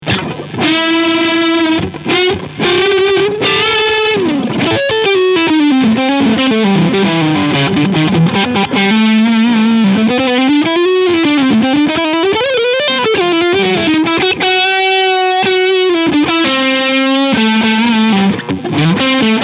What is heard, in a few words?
Overdrive Front PU